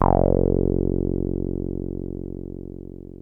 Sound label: 303 F#1, TB 303, Synthesizer; Hollywood Edge; Giorgio Moroder Rare Synthesizer Collection